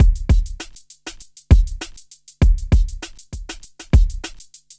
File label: Smooth, R&B Drums